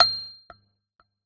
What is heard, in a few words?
Glittering Clink